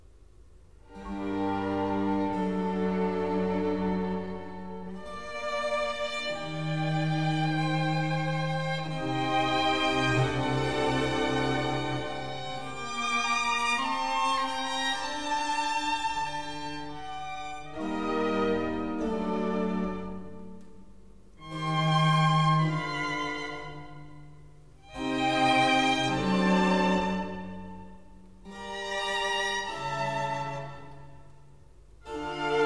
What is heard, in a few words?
Largo, Conciertos Grossos; Concerto No.5 in Bb; Arcangelo Corelli; 12 Conciertos Grossos Op.6 (1966)